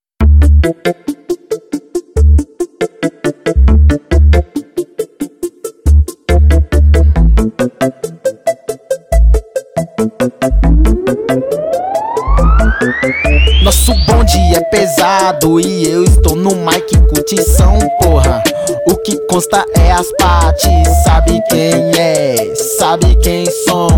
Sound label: Rap